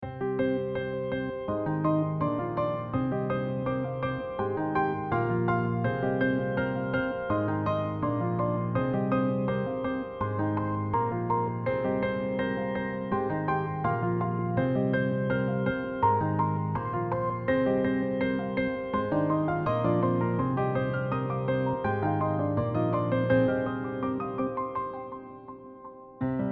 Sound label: Shimmer Riff